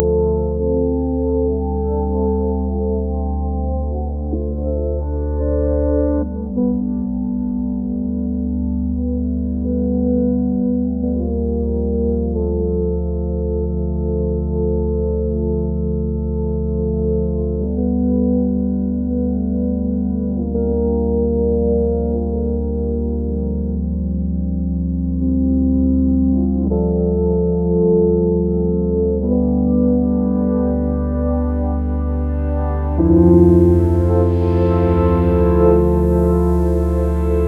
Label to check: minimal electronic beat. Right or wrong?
Right